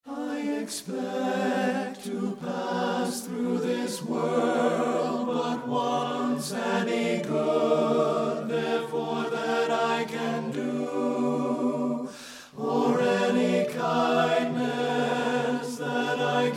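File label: tenor; satb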